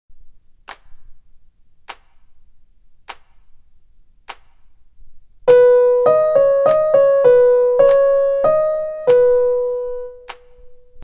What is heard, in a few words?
Melodic Dictation